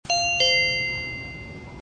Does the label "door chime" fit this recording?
Yes